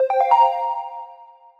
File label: quest task solved, hud; crosscode